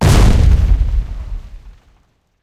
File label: mine explosion; War Thunder